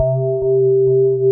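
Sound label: mellow square; Pro-Rec - Synthsonic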